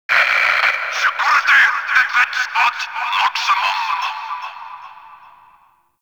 Interior Notification; Warframe; Grineer; Fortress; Ambience

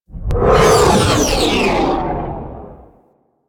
Railjack Sentient Ship Close Short Fly By F; Space Fighter Snt Coil Agent

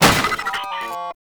Jammer Destroy; Gameplay; Warframe; Nineteeen Ninety Nine; PvPvE

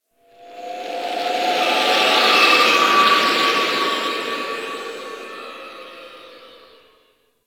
Exterior Screaming Space; Ambience; Warframe; Railjack

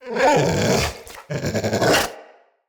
Battle Dog Thankful, Animals; Vocals; Snc Battle Dog

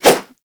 Nunchaku Whoosh; Warframe; Tenno

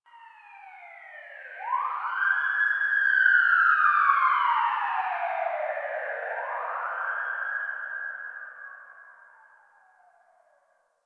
Exterior Distant Ambient Siren F; Warframe; Ambience; NineteenNinetyNine

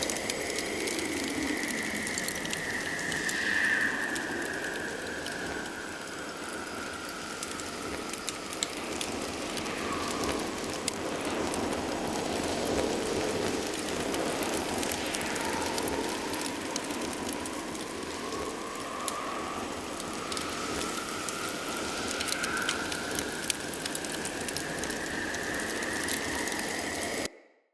Short Sword Fire Blade Loop; Weapons; Elemental

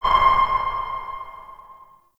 Interior Orb Station Battle Map Ping; Warframe; Tau; Orbital Station

